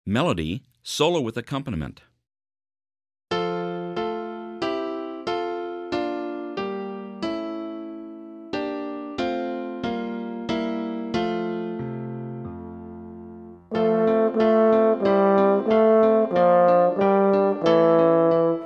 Melody Solo With Accompaniment; French Horn with Piano Accompaniment

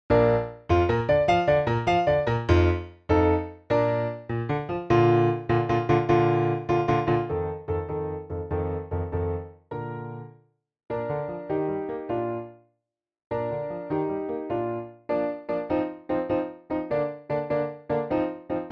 Piano Accomp; Piano Only – Performance Tempo

